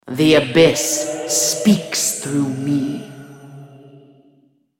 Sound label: move; BlackIceAnivia